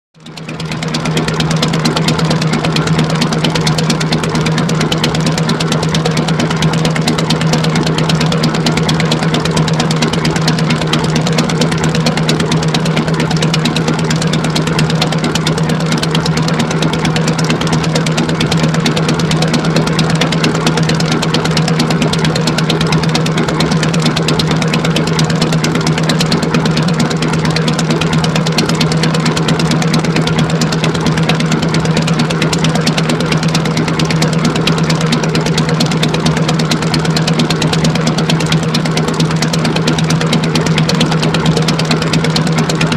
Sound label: Chocolate Factory, factory